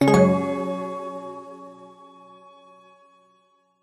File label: multimedia game sound modern warm family game negative strum chime